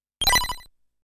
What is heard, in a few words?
game selection